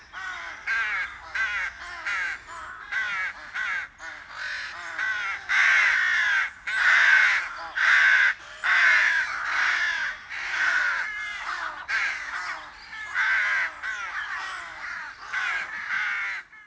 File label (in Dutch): roek